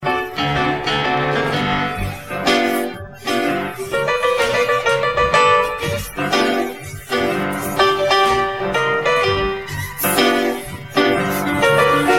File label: PIANO CHORUS